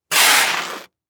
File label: Industry Tool Shovel Dig In Sand